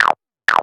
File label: guiro, notifications; Nothing Phone (2)